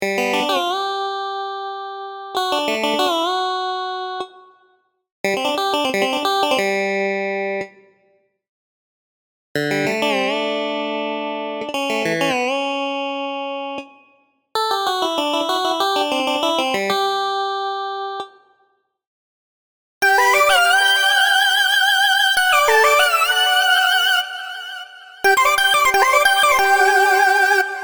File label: Synth Same Melo; Silex